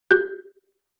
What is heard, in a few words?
Xylophone Chord G; Vintage Cartoon; Musical Elements